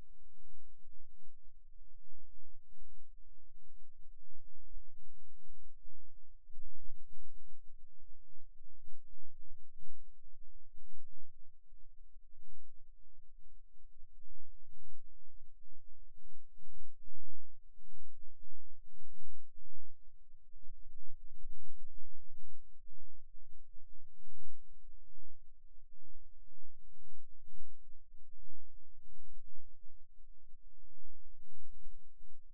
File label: Rhythm Wave, eeg seizures